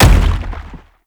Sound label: Biped Step; Warframe; Man In The Wall